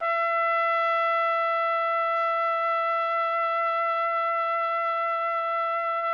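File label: trumpet; Roland - LA Composer vol. 3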